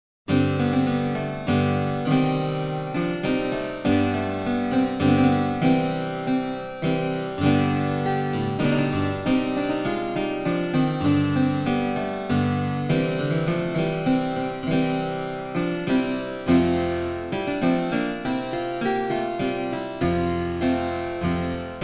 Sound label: German Harpsichord Front 8; German Harpsichord 1738; Front 8 Demo; Excerpt from My Ladye Nevels Grownde by William Byrd; edition germanisches nationalmuseum